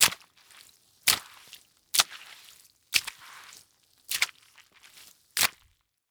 Liquid Water Mop Wet Mopping Sloshing Concrete Floor